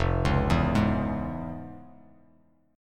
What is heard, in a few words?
F Minor Major 7th Sharp 5th; arpeggiated; JGuitar